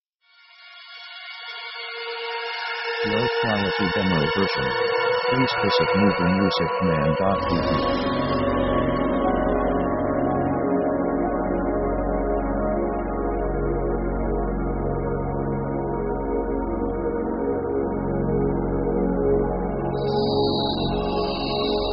flying atmosphere; synth themes

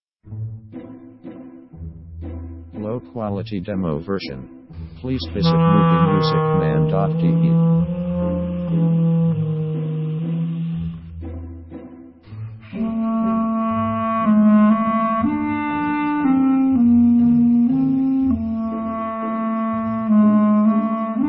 orc walz

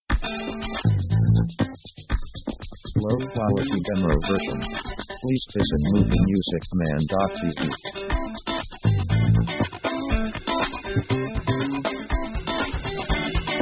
funky driving interlude

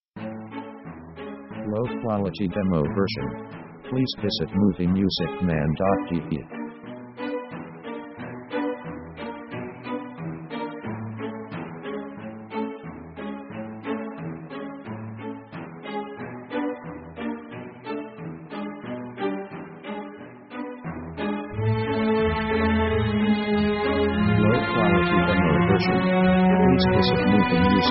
comedy orchestra

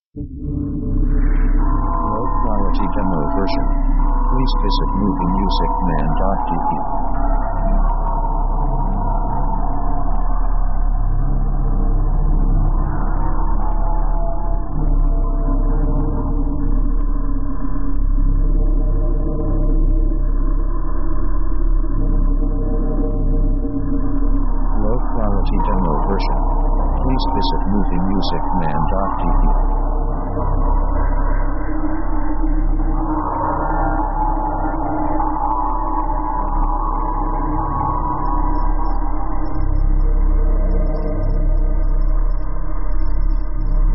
awaiting; synth themes

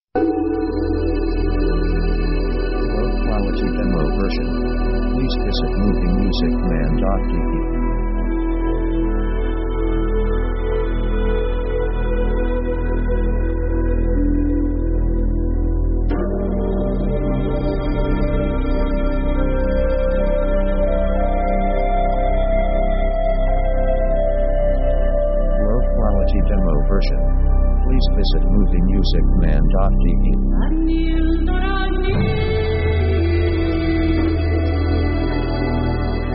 synth nordic; synth themes

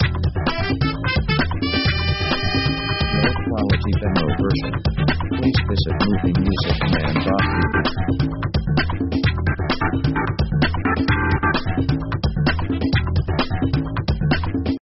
funk 70s chasing score

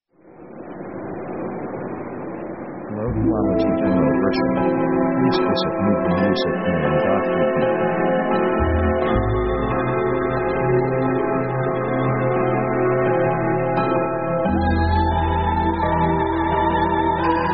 Gipsy Ballad, slow

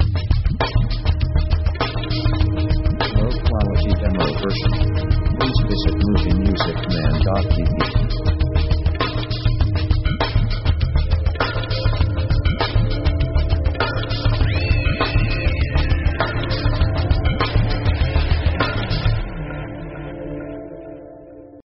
mystic trip, contemplative

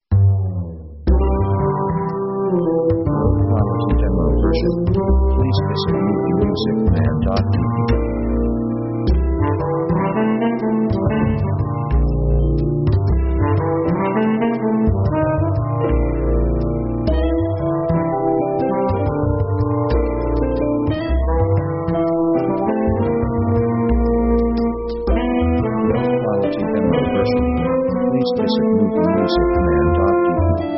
Jazz Med Swing

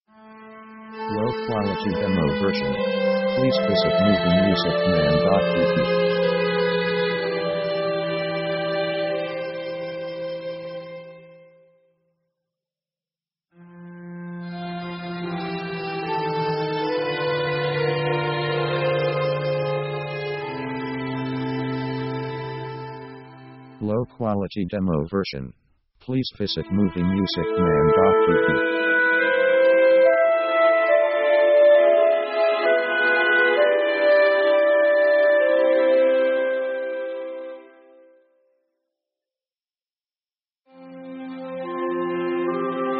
orc autumn melancholy, slow

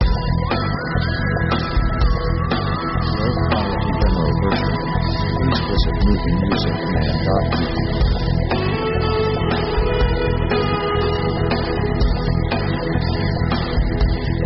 highland march

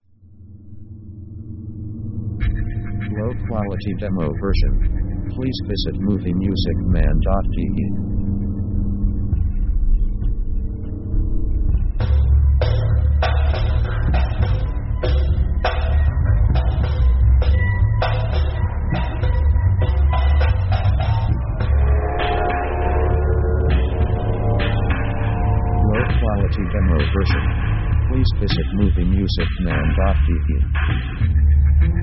DARK WEIRD AMBIENCE; Dark mood

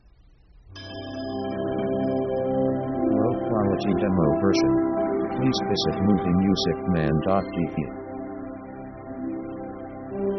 Victory; victory themes

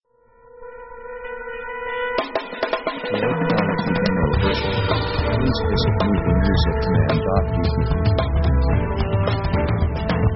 pop orc action

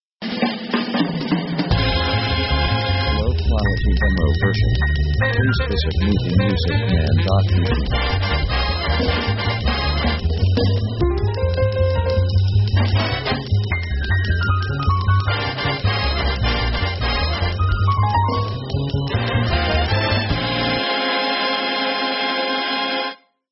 jazz big band 155bpm; action; Action themes

